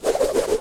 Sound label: wepon swing